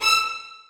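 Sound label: 2nd violins staccato e6; Sonatina Symphonic Orchestra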